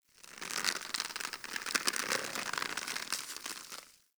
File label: Tendon Stretch Large Close; Ambience; Warframe; Infested Eris